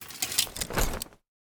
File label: Choose Clothes; LOOPERS